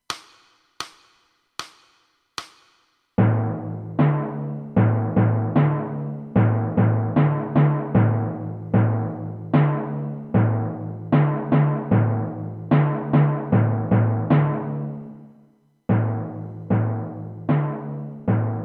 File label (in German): timpani etuede